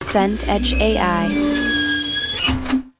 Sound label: door automatic shop